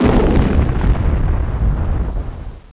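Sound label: explosion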